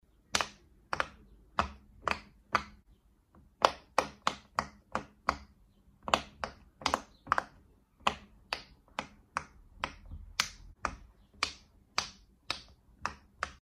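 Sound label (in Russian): звук поп ит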